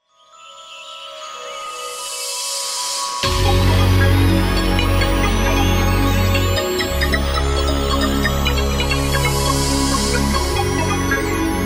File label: loop da loop